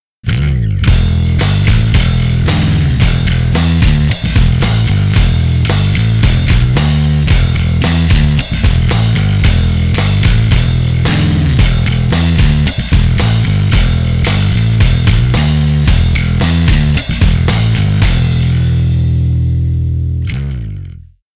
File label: bass